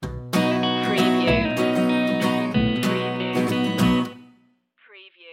rhinestone rambler stinger

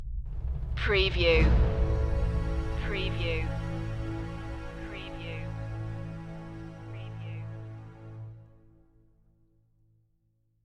age of exploration stinger

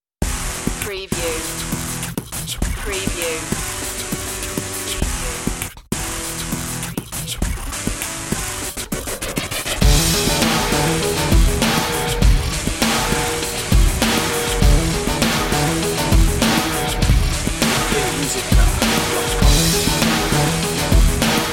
beat boy

